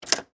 Electric Typewriter, Type, Single, Key, Swintec, 8014-S; Technology; Typing; BlastWave